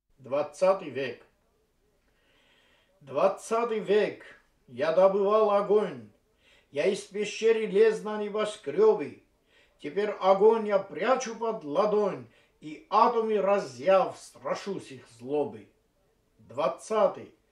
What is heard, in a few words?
instrumental